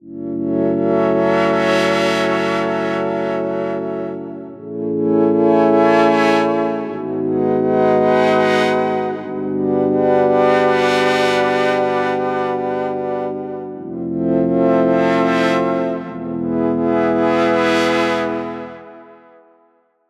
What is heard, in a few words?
105 serum pad Gmin; kachina